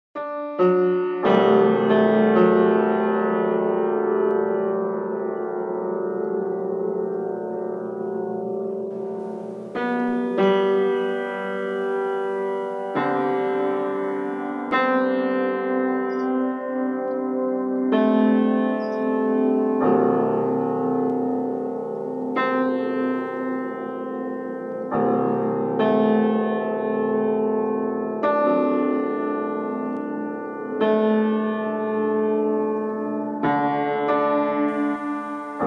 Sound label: disco